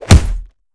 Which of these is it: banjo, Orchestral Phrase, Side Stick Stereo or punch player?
punch player